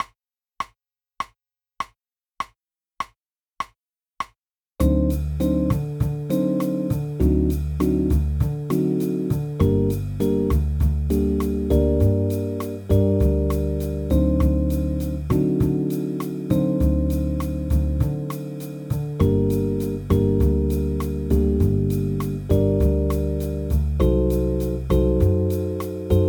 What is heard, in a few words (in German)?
G-Moll Play-Along